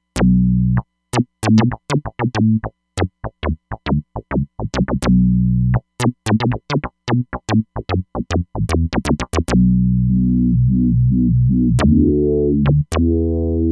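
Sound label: ResoSyn Bs, synth bass; Roland Fantom XR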